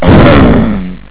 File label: roar